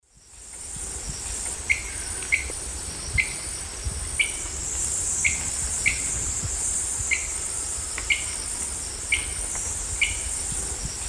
Hemitriccus zosterops, call; S. America; Eastern Lowlands

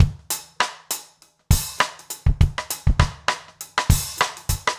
basic funk rims

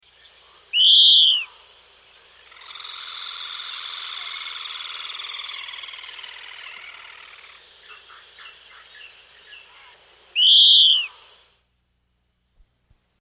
Yellow-Bellied Elaenia; S. America

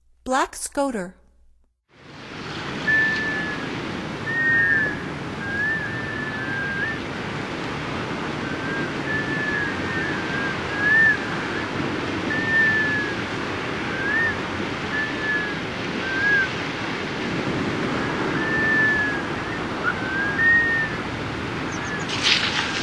Black Scoter; North America; Stokes

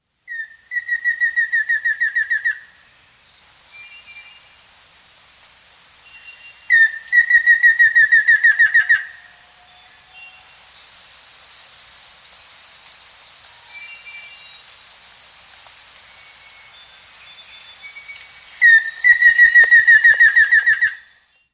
Black-Faced Antthrush; S. America

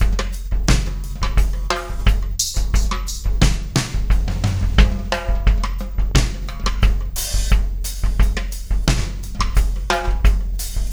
DRUM; DRUM SUGAR

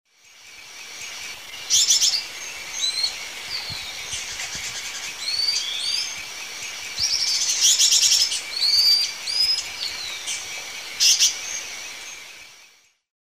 Ornithion inerme, calls and song 2 birds; S. America; Eastern Lowlands

